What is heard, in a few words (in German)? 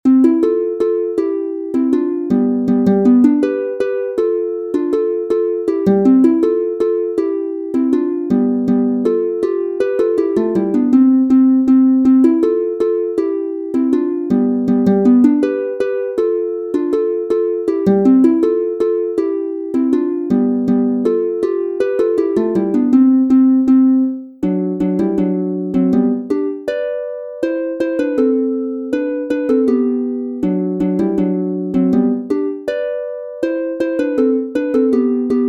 Volksmusik aus Bayern C Dur G Dur F Dur, harfe